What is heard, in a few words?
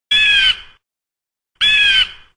bird cry